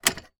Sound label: household mains fuse box switch off